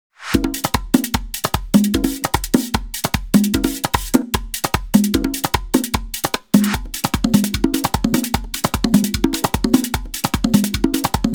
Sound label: Mambo for Merengue Loops Only Perc 150bpm